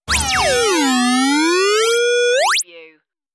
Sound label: tonal transmission interference; radio; AM / FM tuning, static radio sounds